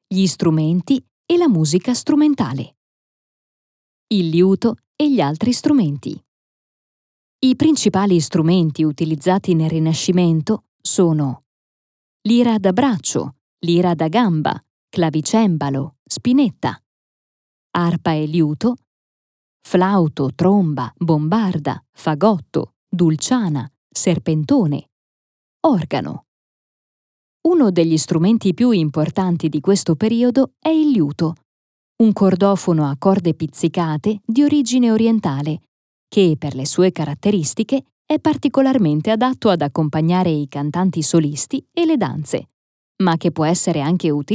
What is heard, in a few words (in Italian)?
strumenti musica strumentale